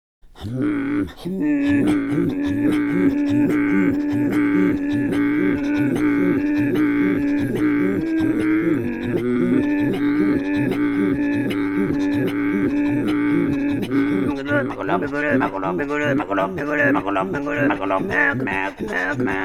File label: Throat Singing, INUIT; Amuma